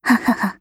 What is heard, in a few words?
laugh; Eternal Return; Katja; KOR